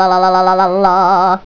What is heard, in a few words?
lalalah